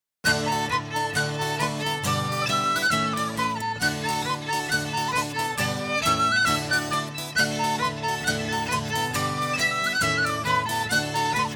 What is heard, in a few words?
Polka; Peg Ryans Polka